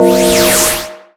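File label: Battle Skill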